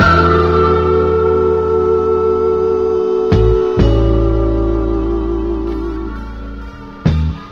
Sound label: guitar